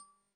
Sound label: gem collect; Items & Pickups